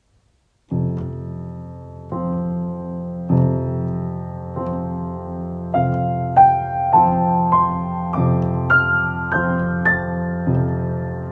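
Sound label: Valentine Box, Piano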